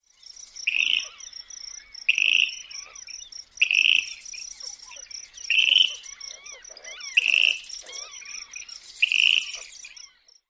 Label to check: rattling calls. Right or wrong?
Right